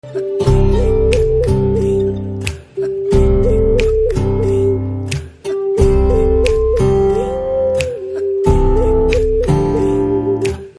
BGM Telugu Ringtone

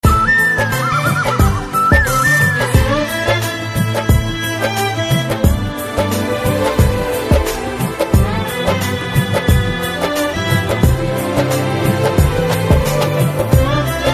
BGM Telugu Ringtone

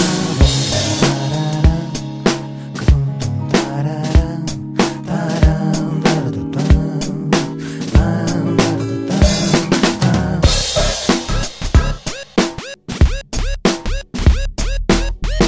Theme Telugu Ringtone

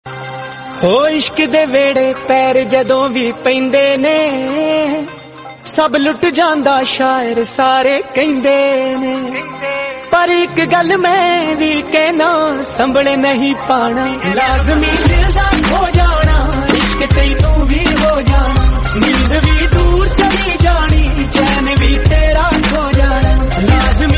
Punjabi Ringtone

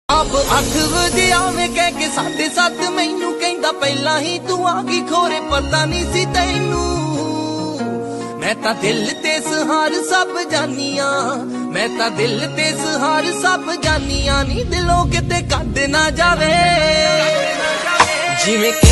Punjabi Beats Ringtone

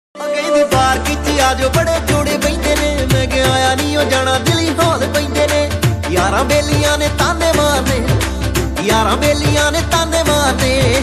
DJ Punjabi Ringtone

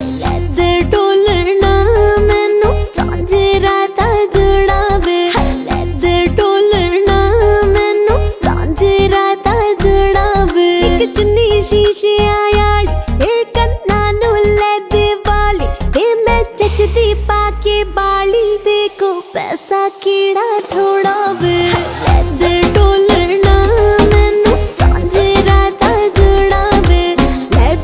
Dance Punjabi Ringtone

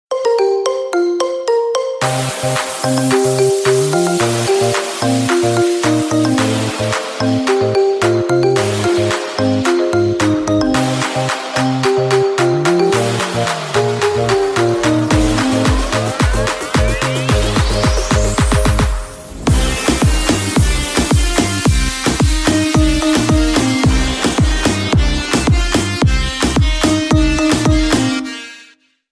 Marimba Ringtone

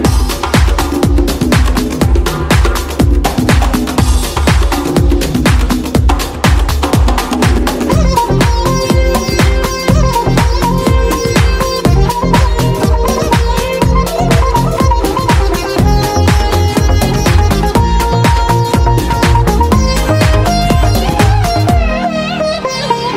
Violin, Telugu

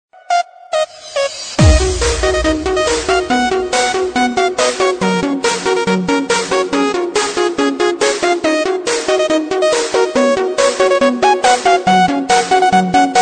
Cool Techno Ringtone

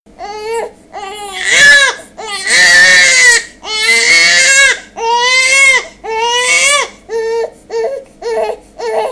Baby Crying Ringtone

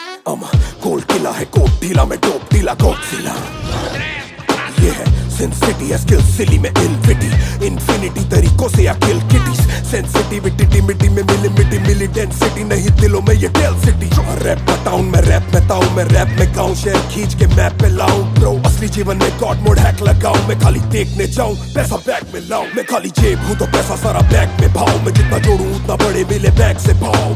Rap Ringtone

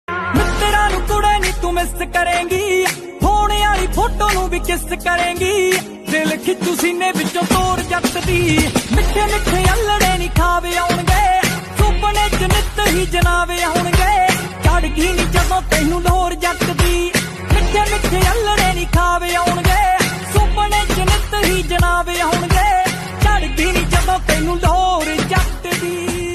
Desi Punjabi Ringtone

